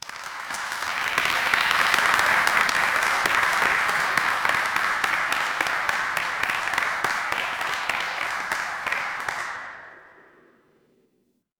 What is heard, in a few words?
crowd applause